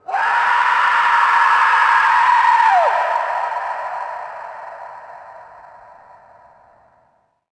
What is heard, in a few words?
scream